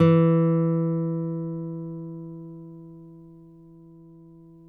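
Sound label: nylon, FLAMENCO, Guitars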